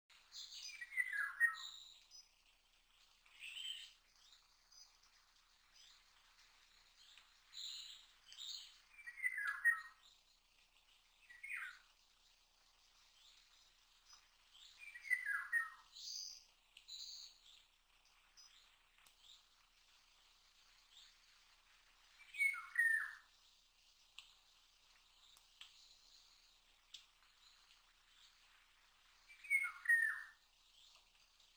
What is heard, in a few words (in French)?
chant du Loriot, Le loriot d'Europe Oriolus oriolus